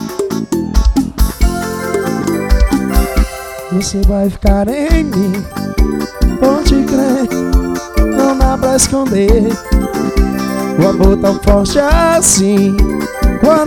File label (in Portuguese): forro ao vivo